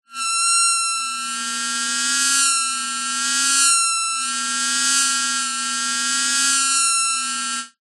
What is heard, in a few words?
squeak air blow broken squeaker from toy